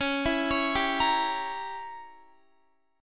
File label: Db Diminished 7th, Guitar; Dbdim7; arpeggiated; tuning=4F,4A,5C,5G,5C,6E